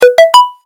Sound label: Whisper, notifications; Huawei Ascend Y330